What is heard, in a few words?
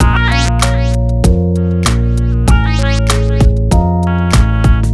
rnb, ringtones; Google; NewWave Labs